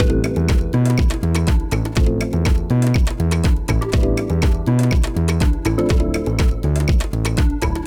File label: Loop